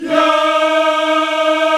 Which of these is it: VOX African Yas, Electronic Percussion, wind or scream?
VOX African Yas